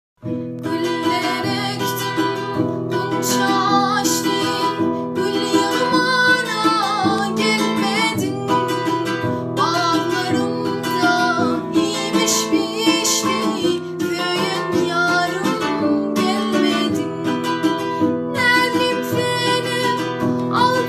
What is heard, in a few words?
Khanande Zan, Guitar; ترکمنی